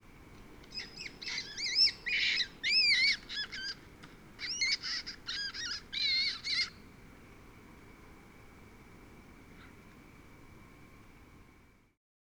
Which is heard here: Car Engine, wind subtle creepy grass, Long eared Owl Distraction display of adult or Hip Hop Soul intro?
Long eared Owl Distraction display of adult